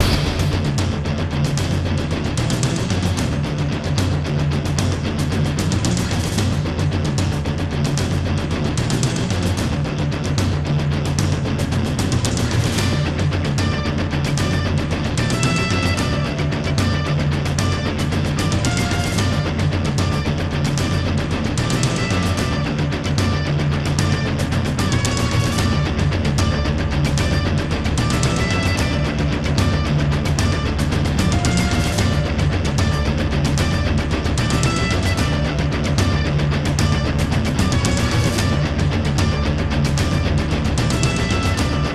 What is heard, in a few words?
NO BRASS STRINGS; gamerip